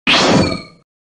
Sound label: teleport sound